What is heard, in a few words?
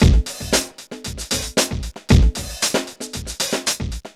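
115 bpm, Funky loops; Zero-G; Funky Elements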